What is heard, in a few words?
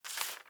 pickup paper; Rust